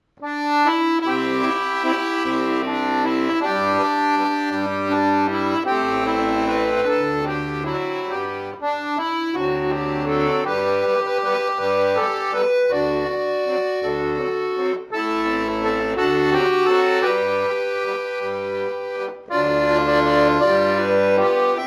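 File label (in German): Süsser die Glocken nie Klingen Akkordeon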